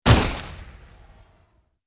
Bullet Time; FXHome; Bullet and Gun

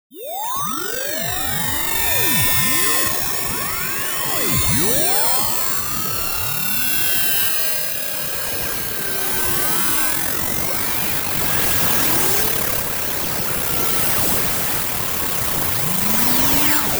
Crazy Radio, 70s Sci Fi

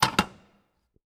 Steel Door Handle; 99Sounds; The Warehouse

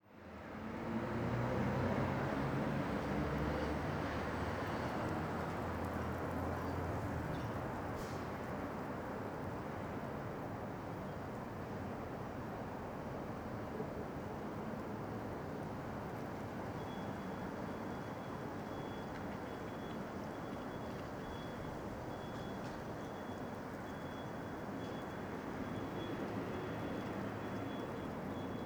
City Wind, Leaves, Distant Traffic; Ambisonic City; Stereo (LR); 2496SoundEffects

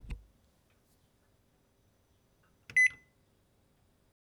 Beep, Household Drones; 344 Audio

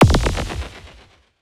Percussive Elements; 99Sounds; The Weird Side Samples

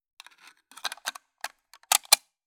GUN Rifle Bolt Action Mag Insert; Spectral Vibrations; Gun Mechanics UK Police

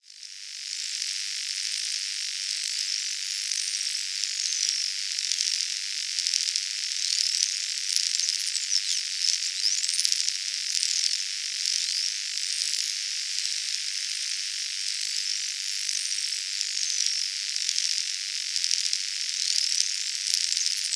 ambience, Electrostatic Field

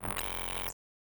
GLITCH SCI FI COMPUTER; MatiasMacSD

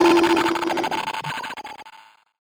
Tech, Weird Side Samples

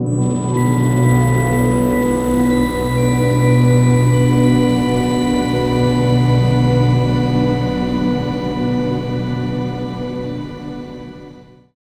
synth texture complex; BluezoneCorp; Better World - Ethereal Ambient Samples

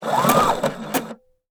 Sewing Machine Seam Revving Fast Distant; InspectorJ

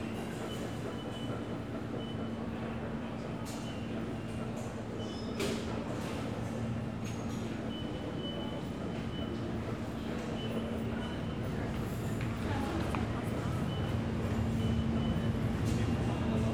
New York City Herald Square Subway Station

